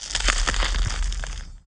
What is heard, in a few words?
boss umbra release